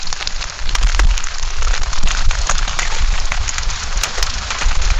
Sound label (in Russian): dojd po opavshim listyam